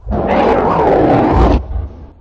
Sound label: summon; svencoop; tor